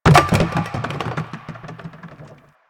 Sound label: Large Plastic Garbage Bin; Warframe; Ambience; Nineteen Ninety Nine